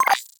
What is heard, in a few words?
UI Default Theme Squad Received; Warframe